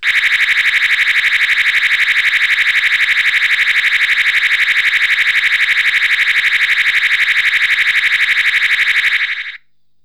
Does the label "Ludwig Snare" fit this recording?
No